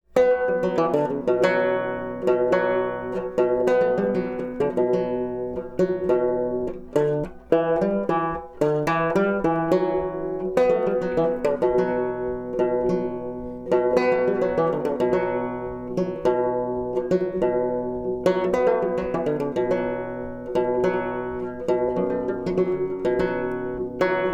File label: fretless; Koromanti2